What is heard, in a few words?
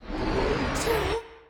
Punch Right Speed Up; Pitch Black - A Detective's Demise